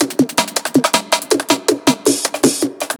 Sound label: Fill, Drum N Bass Percussion Fills n Breaks